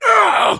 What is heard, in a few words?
grunt